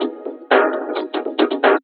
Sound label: Vinyl Bumpers, Fx; Sonic Foundry (Sony Creative Software); Esoterik Beatz; Vinyl101